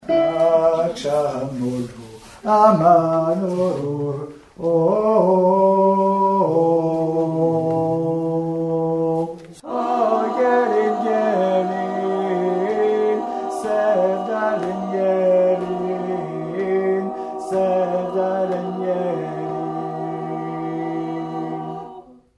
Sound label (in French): HOMMES; Aksam olur